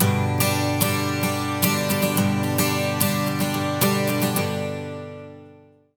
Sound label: 110 MAJ 12 STR, Acoustic Guitar Loops; USB Soundscan vol.04 - Electric & Acoustic Guitar Loops; AKAI